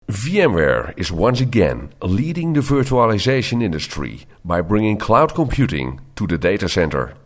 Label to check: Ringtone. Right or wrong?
Right